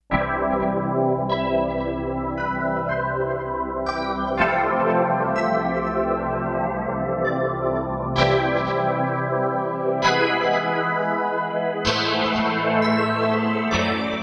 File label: Vector, Kawai K5000S factory presets